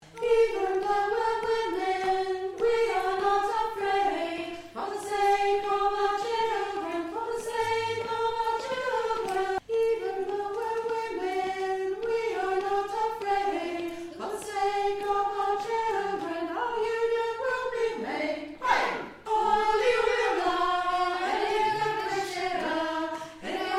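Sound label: Soprano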